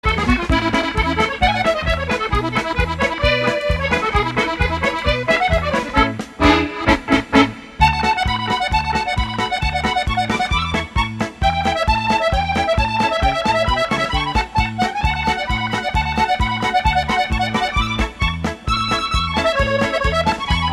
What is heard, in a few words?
Polka